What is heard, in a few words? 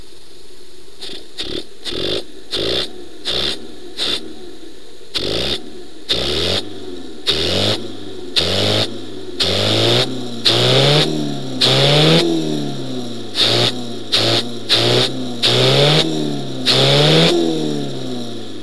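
normal 10 after top dead centre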